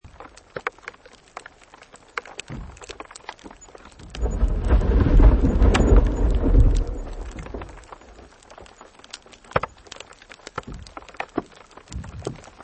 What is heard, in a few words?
Glacier cracking; glaciers; greenland; The President’s Adventures in Knowledge-Land Vol. 4 – Hokkaido Universiy